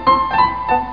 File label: Lightning Storm; Win95